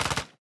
heroes attack; goblidrone

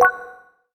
medical kit heart add

